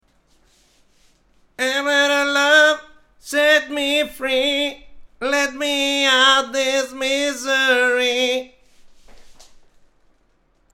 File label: Twang nasal male